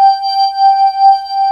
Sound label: GLASS PAD G4, SYNTHESIZER STACK; STACK SYN #2